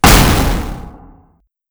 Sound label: long Tap